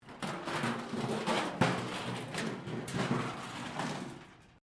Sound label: metal rubbish bin move concrete; Metal (Sound Effects:Materials)